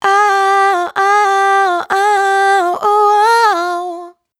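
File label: riff Ah Wah 128 F minor, Vocals, Dry Vocals; Raw Vocals; Slate Digital Omnivox Vocal Sample Pack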